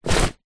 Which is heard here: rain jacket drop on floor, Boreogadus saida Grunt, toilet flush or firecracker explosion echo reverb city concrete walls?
rain jacket drop on floor